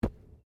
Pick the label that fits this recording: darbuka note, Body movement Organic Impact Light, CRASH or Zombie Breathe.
Body movement Organic Impact Light